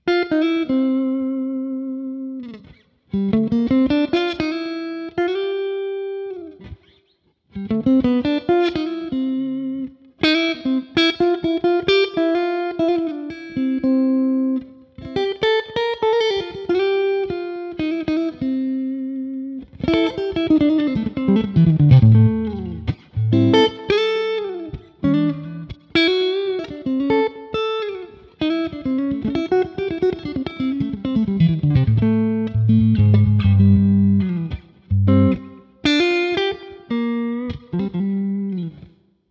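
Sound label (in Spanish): punteo limpio, guitarras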